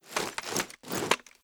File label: ax50 draw, weapons; albanyknight; css